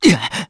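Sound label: Damage, Korean; Zafir; kings raid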